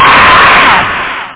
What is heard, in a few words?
cry